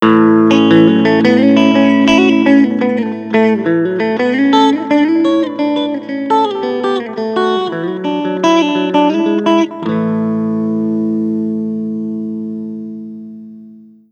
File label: Magnasync URS clean